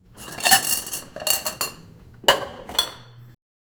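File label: indoor cafe sounds gentle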